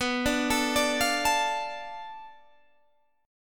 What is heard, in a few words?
B Minor 7th Flat 5th B, Guitar Standard; Bm7b5; arpeggiated; 19,17,19,19,18,17; JGuitar